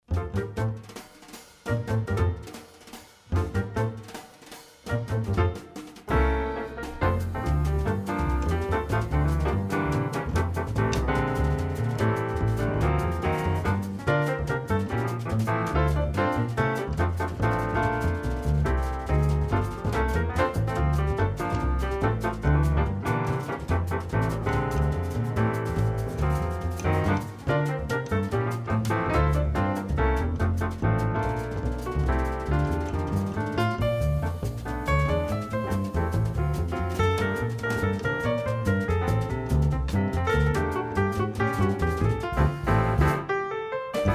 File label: jazz trio